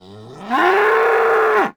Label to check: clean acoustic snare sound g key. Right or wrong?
Wrong